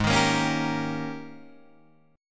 Ab Major 7th Suspended 2nd Suspended 4th Ab, down, Guitar, Drop D